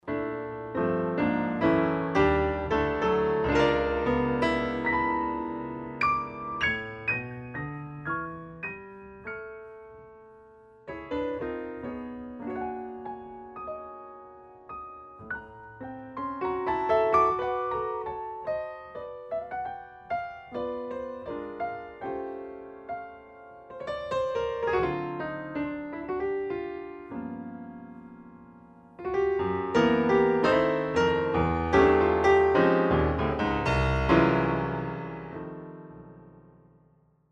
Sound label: Piano